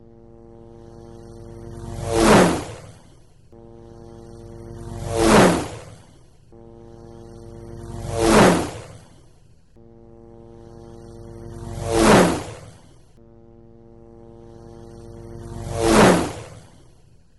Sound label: race car